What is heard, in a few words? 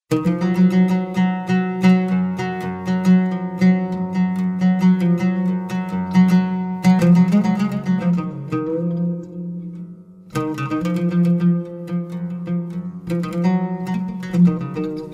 Instrumental